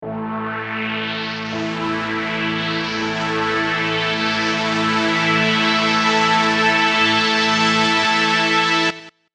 Moodiest Moogs; gforce software

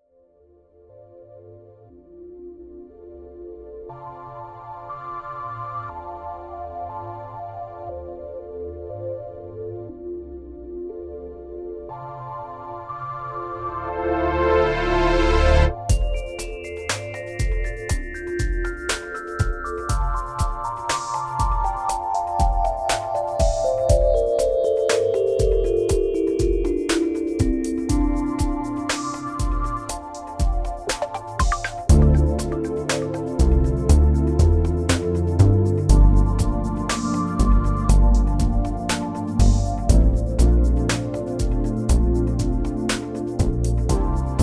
Singing Windstorm; ImpOscar2; gforce software

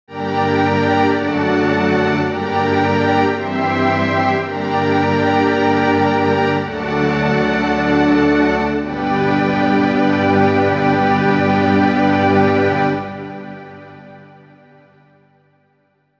String Ensemble; ORCHESTRON; gforce software

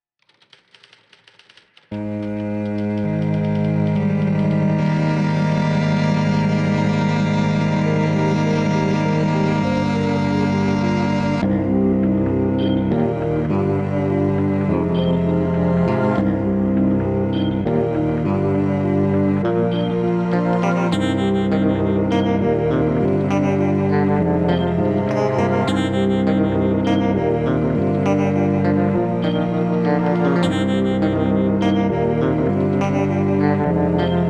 M Tron Pro; gforce software

